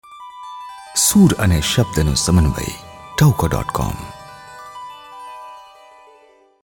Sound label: bhajan, tahuko